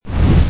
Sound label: fire Launch; merge-dragons-2